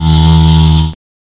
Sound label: teleport in